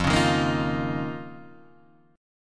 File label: F 7th Suspended 4th Sharp 5th F down Guitar Half Step Down; F7sus4#5